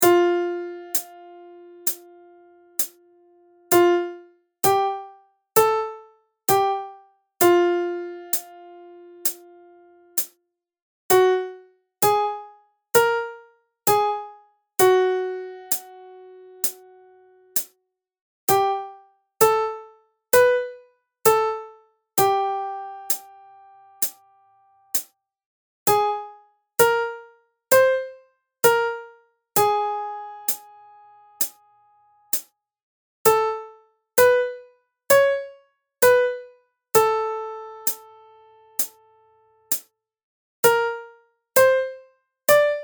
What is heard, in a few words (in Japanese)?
裏声練習フレーズ Women